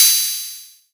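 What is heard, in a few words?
hi-hat open, EMU Orbit-3 drum machine; 300 Drum Machines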